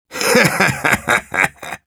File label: laugh evil, ru